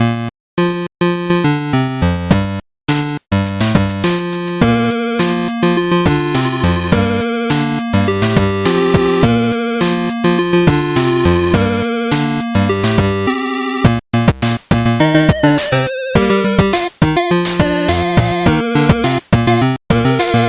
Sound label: 8-bit Cover; Pony Music Archive